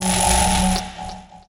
Loop Magic

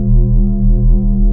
Super Bounce Active; Marble Blast Gold

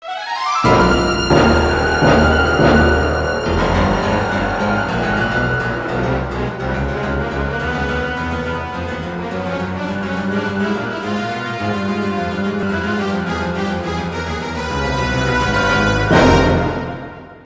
Music Horror Theme; Nancy Drew: The Phantom of Venice

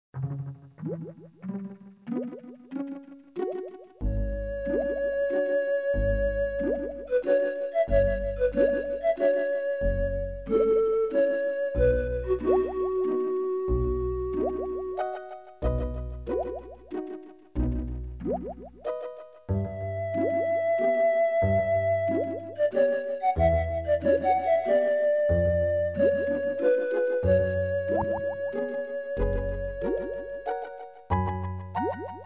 Calm Drift

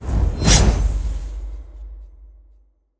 shadow explosive kunai throw

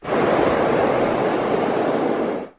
Takeoff

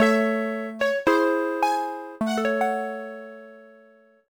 Lose (Or Draw); the legend of zelda phantom hourglass gamerip

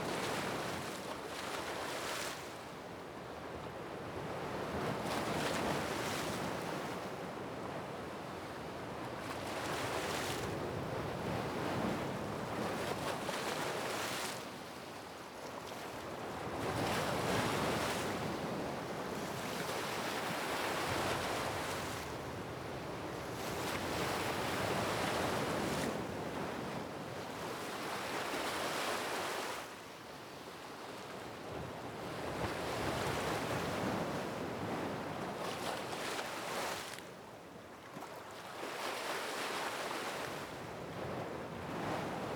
Ambience - night shore; Sim City Societies

